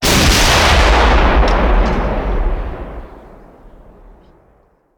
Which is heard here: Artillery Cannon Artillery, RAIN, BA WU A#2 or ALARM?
Artillery Cannon Artillery